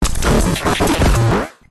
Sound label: glitch heavy; silverplatter audio